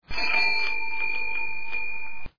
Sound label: bell